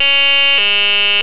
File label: player hit